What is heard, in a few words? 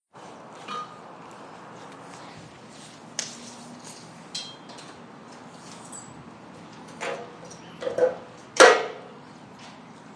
measuring cutting